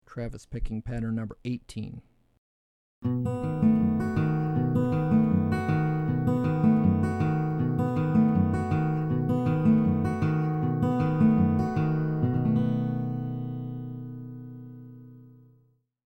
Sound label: Travis Patterns; travis style lesson materials